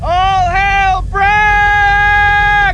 hail brak

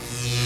shock

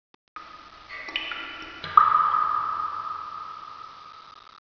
cave

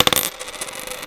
coin

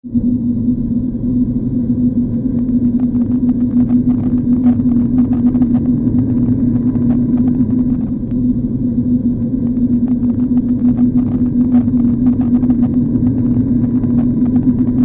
cave wind

